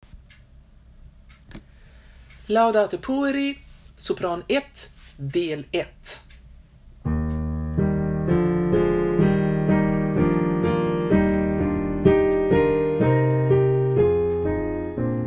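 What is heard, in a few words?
Laudate Pueri soprano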